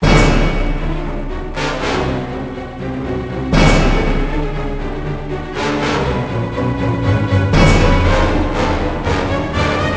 Song Urgent; ultimate spider man ds gamerip 2005

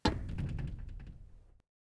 glass land

